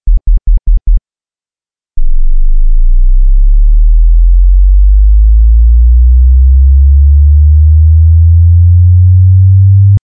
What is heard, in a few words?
sweep